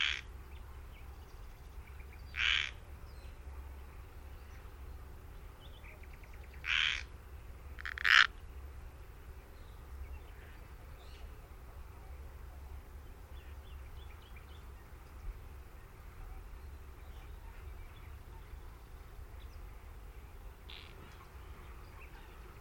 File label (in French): chant rainette meridionale; MNHN SO